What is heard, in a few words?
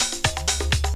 drumloop; FMOD